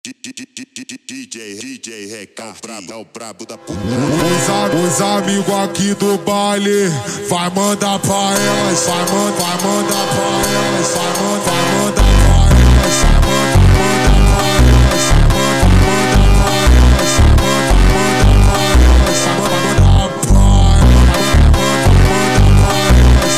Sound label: slowed, funk